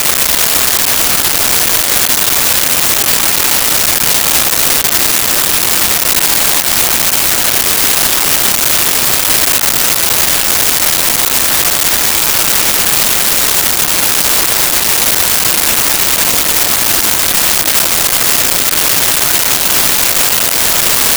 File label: Lawnmower Manual Medium; Home & Office